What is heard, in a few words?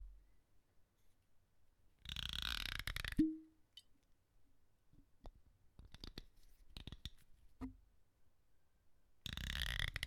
Cork Bottle Opening